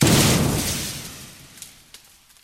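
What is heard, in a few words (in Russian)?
sound of flame from a launched rocket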